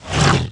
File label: Attack Slash, Mutant